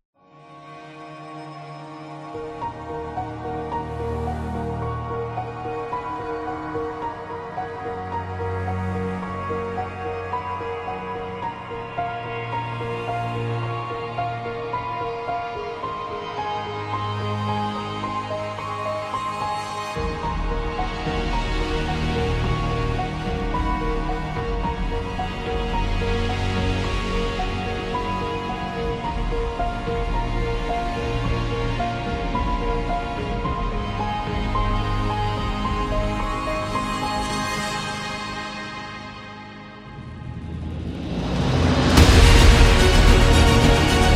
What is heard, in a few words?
From Sad to Inspiring